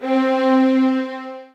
C, soft violins; Q Up Arts; Denny Jaeger Master Violin Library

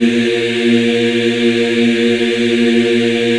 A#2, Choir; USB Soundscan vol.28; Acoustic & Synth; AKAI

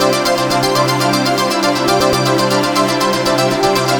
(arp) single chord

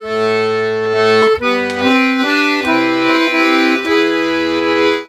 Accordions; USB Soundscan vol.40; AKAI

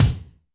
Kik DDL Slap, Drum Machines Alesis D4

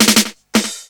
FILL DRUM; USB Soundscan vol.27; FILLACOUS

